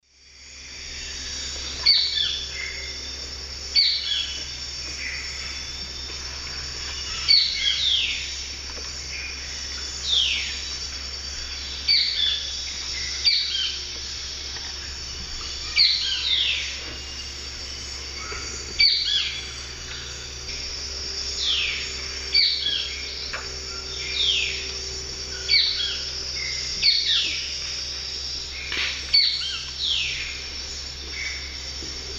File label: Myrmotherula axillaris, calls; S. America; Eastern Lowlands